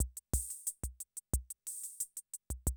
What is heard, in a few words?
CR78, DrumLoops